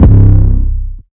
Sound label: stop 808; drumkits; stop ii 808; fl studio